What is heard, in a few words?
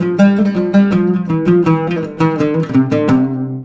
LUTH F, Arabian Traditions, LUTH RIFF; USB Soundscan vol.43; AKAI